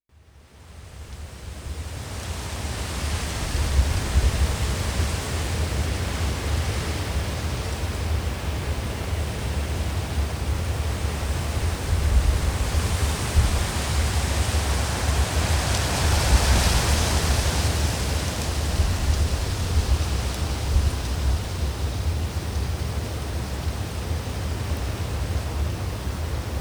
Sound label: wind